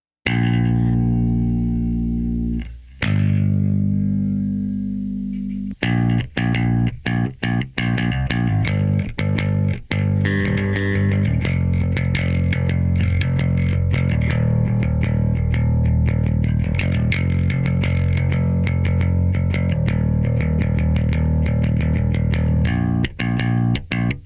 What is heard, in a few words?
Bass; multitrack